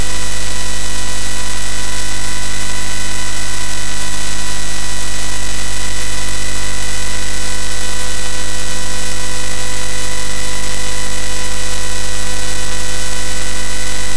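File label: Mad Planets, MOS 6581 SID chip music, games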